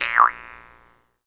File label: Jaw Harps